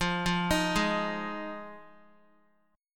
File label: F Minor 7th, down Guitar; arpeggiated; tuning 4F,4A,5C,5G,5C,6E